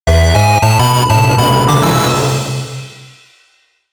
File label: Music Gauntlet Section Complete; Dungeon League